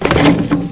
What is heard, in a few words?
FUEL OFF2; CD Action